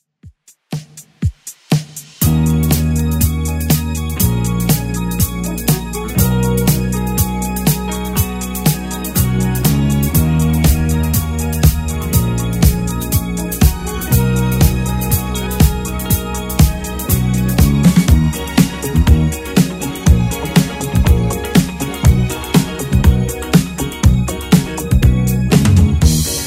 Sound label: 80s 121bpm; Redrum